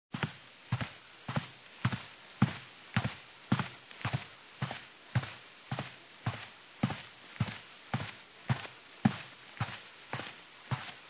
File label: Steps in shoes on a carpeted wooden floor